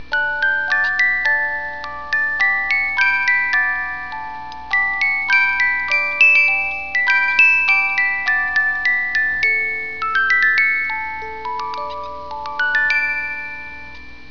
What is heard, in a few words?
Balloon Melody